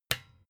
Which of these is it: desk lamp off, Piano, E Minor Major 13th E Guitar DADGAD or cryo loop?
desk lamp off